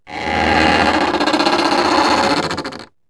DOOR; SCALA

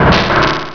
BANG

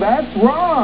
wrong; dot2dot

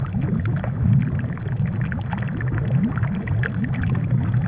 lava

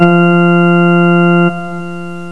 ORGAN NOTE

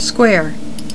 SQUARE

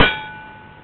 sword CLANG

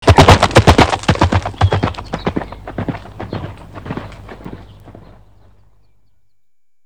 GALLOP; SOUND FX; cubase

